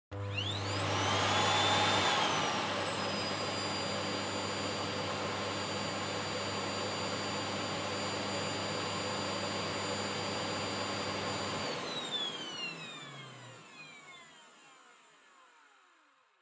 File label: Vacuum Cleaner Vacuum Low Long